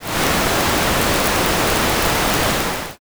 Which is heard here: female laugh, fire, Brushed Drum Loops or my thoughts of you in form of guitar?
fire